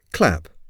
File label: clap